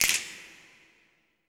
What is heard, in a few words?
snap afro, afro snaps, hands; Roland LCDP14 Africa VOL-2